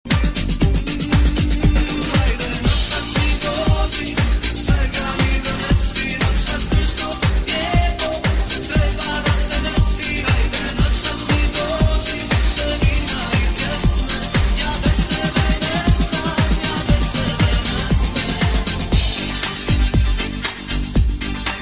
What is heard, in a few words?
Party Beats Remix